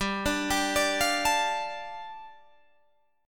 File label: G 7th Suspended 2nd G, Guitar Open D